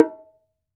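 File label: low bongo open; AKAI S-Series CD-ROM Sound Library VOL-5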